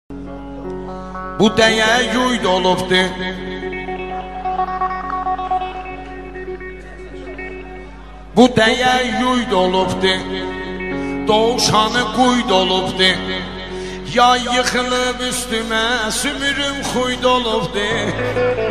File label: Turkish Dance Music